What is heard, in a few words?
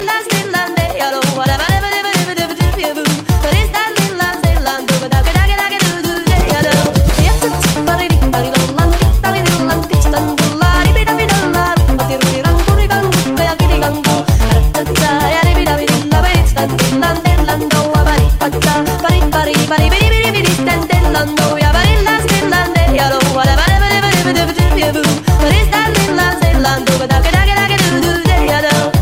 Polka